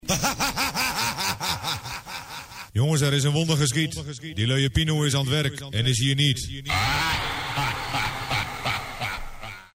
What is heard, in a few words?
Jingle; Audio Fragmenten; Arkan Radio 2